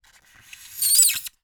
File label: Polystyrene Squeak